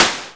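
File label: snare drum, Simmons SDS8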